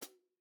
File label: T HI HAT FOOT, drum; t series drum pack